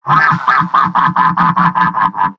pyro laugh happy; mvm

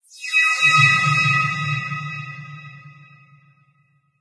amb random tech; video games; ambient; random abstract; source engine; portal2

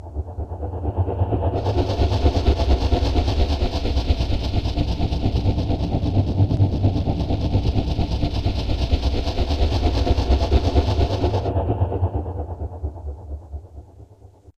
weapon strip; video game; source engine; hl2; citadel

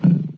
dangerous creatures menu command; windows 9x; sound schemes

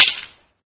baseball menu command; sound schemes

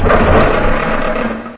rail stop; Source Engine; bshift

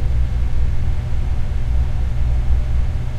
tone; tf; Source Engine; video games; ambient; lair

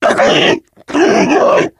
charger lurk, voice idle

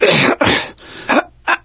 choke, gambler, survivor voice; source engine; left 4 dead 2

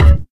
physics cube impact hard

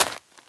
gravel, footsteps run

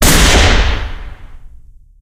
sniper; bazaar bargain; tf

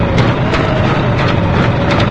engine, machines; ambient; Source Engine; video game

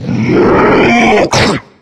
melee, voice attack; video game; source engine; left 4 dead 2; charger

